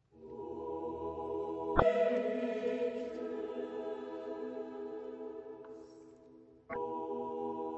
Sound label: antiphon